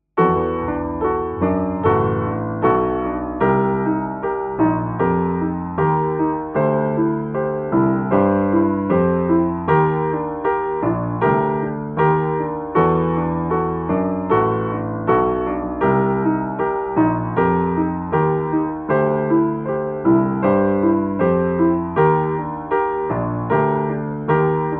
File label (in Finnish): piano basso kuvio